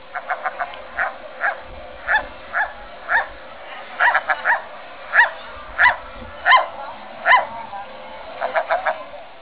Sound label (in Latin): larus argentatus